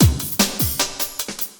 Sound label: LOOP, Drum & Bass, 150 BPM; Ueberschall; Drum & Bass Resonance